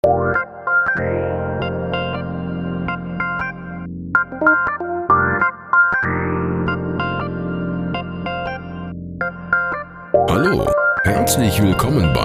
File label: wurlitzer